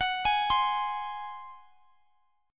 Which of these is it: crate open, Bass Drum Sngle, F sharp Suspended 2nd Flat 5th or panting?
F sharp Suspended 2nd Flat 5th